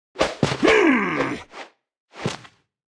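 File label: Anim Super Barbarian, 动作音效; 野蛮人; 绿野世界; 部落冲突; 皇室战争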